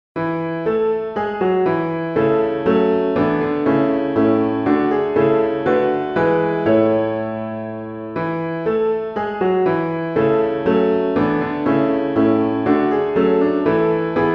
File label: piano all parts; Psalm1